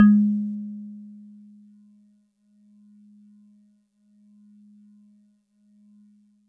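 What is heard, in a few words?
Celeste DX100 G#1; Pristine Celeste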